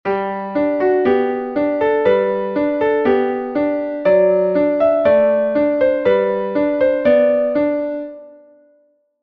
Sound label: alberti bass; mendel reissmann